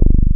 101 BASS; Pro-Rec - Synthsonic; JV ANALOG1

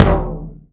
bass drum; Zero-G - Chemical Beats; BASSDRUM ZOO

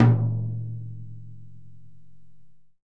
Tom mf; Digital Sound Factory

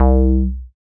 SYN TB SUB, Roland TB-303; Sound & Vision - Gigapack I CD 2

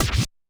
Korg 01W MegaDrums; 300 Drum Machines

